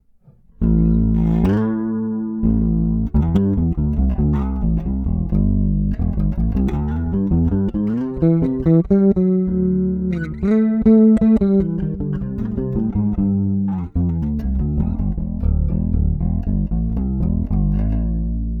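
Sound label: Tc Electronic Afterglow Mix 100 Depth Rate 50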